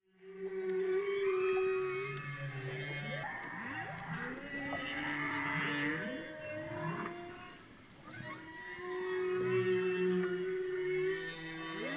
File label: Hump